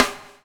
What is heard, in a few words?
BUBL; Spectrasonics - Backbeat